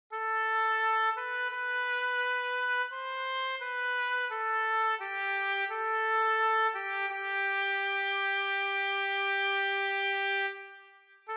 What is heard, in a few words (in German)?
Slow Fox Oboe